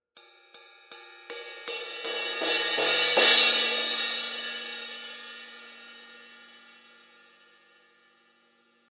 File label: 20 I Crash Ride crescendo hits, cymbal sound